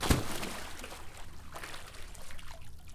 water slap; E-MU Producer Series Vol. 3 – Hollywood Sound Effects; Water Slaps

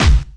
bass drum, Drum & Bass

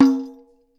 JING DRUM, Ritual Drums; E-MU Producer Series Vol. 7 – Old World Instruments

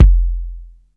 BD W kick; EMU Orbit-3

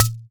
CHEKERE SHOT; Akai MPC3000

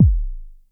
909 bass, Drums, Roland SH-09

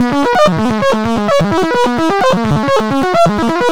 highland fiddle Eb 129, synth loop; Zero-G; Soundclash; Nu Dance Electro

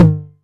Tom Hi, Novation Drumstation 909

